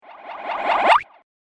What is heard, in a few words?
Laser Hop And Jump Sound, science fiction sound effect